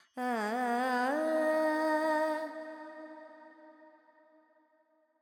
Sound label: FX Phrygian, Carnatic Female Alaps; Sample Banks